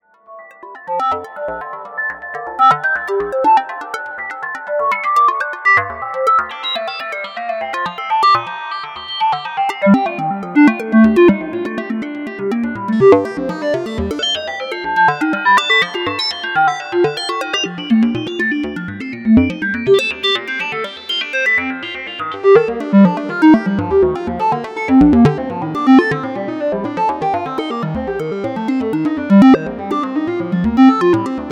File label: solo resonator, 32 Voice String Synth Eurorack